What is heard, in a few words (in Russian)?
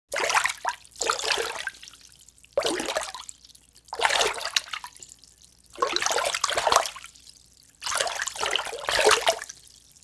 Malenkie vspleski vody